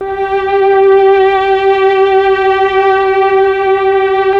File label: STR, Tron Combo; Roland LCDP09 Keys of the 60s and 70s 1; CMB Melo.Combo